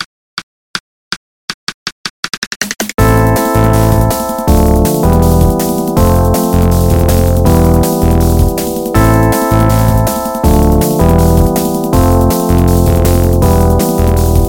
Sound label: 8-bit